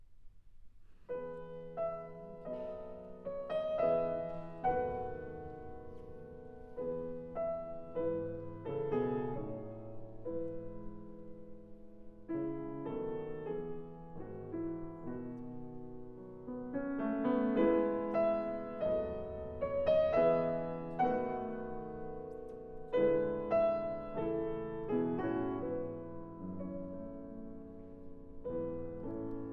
Trio for Piano, Violin and Cello in A minor Andante con mo; Tema con variazioni; Op． 50; Deutsche Grammophon